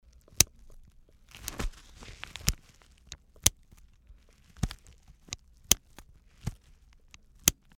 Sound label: purse